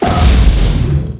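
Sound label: explode water; weapons; quake2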